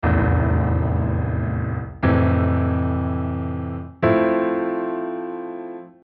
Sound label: Fmaj7 in different registers